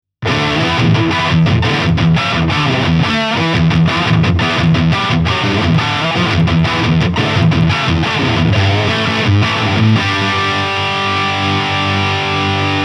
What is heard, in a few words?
Chapman Guitars ML2 Lead; Pro Azure Blue